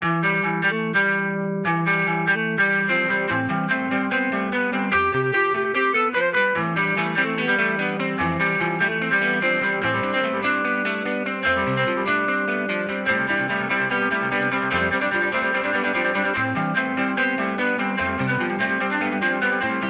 Guitar; Song Writing Guitar + Shreddage

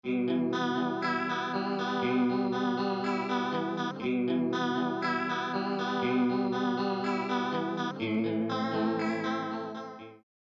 delay fx

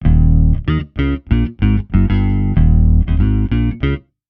Sledgehammer

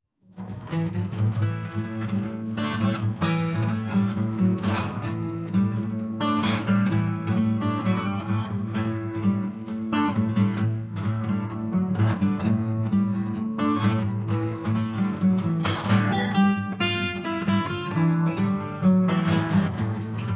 blues, solo acoustic Mississippi Delta blues; Stable Audio

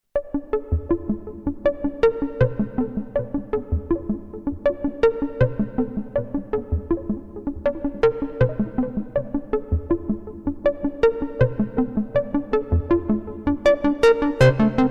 Dyna Pluck Arpegiated; 8Dio; Sequential Prophet XXL; Dyna Pluck Arpegiated Preset

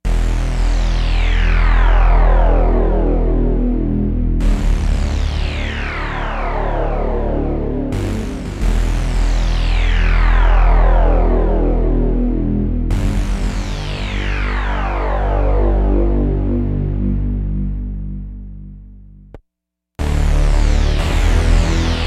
OBX128; 8dio

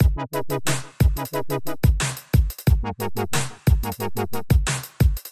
Filter Modulation

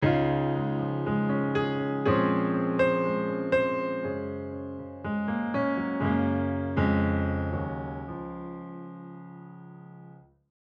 PIANO; shadowhills

